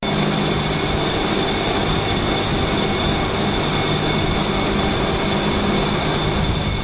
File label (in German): kältemaschine